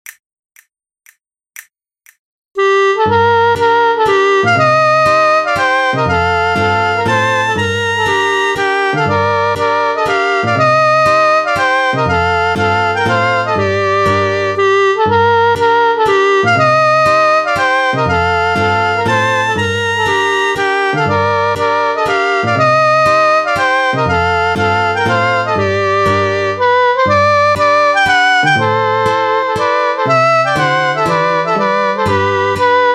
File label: Mazurka; Mazurka a Susanne